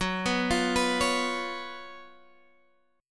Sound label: Gb Major 7th Suspended 4th Gb Guitar Drop D